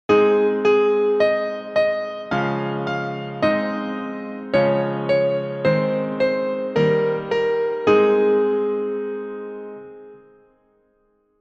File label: twinkle